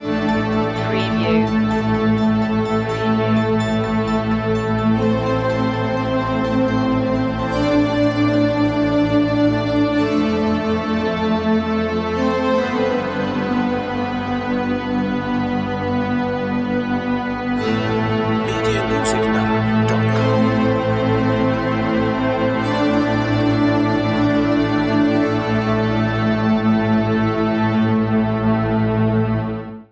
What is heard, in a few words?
Synth pulses with a cinematic feel. Retro electronica, Electronica Melodic